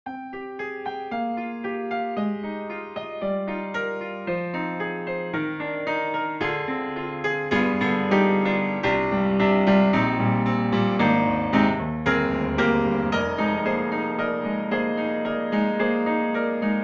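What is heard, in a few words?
Conservatory, piano; Steinberg HALion 6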